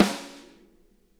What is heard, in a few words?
SNARE, Interactive Drum Kits; Sonic Reality